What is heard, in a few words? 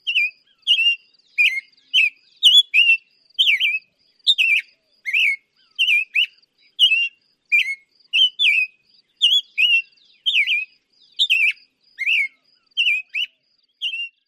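SUMTAN dawn song num 2, summer tanager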